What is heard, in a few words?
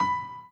Piano Chord